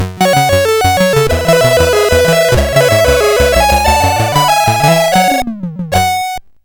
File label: sid CSG8580R5, GAMES; Continental Circus